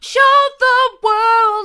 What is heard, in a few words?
hard, vocal; show the world